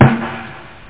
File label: heavy, sdrum; Amiga 8-bit Sampled Voice; 8,363 sample rate